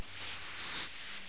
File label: Scorpion Breathe; Knight Online